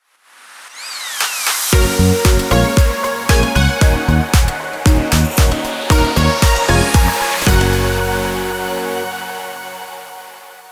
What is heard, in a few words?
Jingle